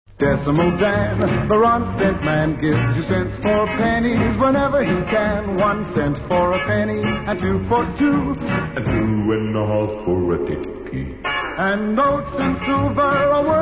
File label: jingles